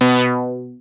ARP 2600 B4; Hollywood Edge - Giorgio Moroder Rare Synthesizer Collection

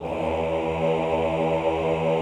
AHH E1, Classical Choir; Best Service; Extended Classical Choir

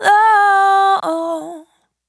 Urban Dry, Single Voice Melodic Loops; Pocket Diva World Class Vocal Samples

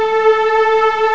HISTRNG, CMI Factory

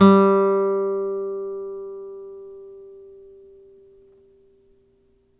NYLON F G2, GUITARS; Synclavier Sampler Library

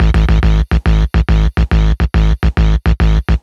140BPM G#; Vengeance - Essential Clubsounds Vol.4

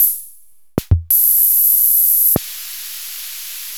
Sound Master, Memory Rhythm SR-88

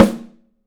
Studio Snare; 3D Percussives; Studio Snare 01

